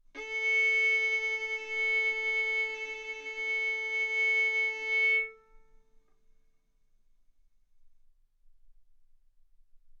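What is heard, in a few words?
Violin arco mf A string A4 B4 stereo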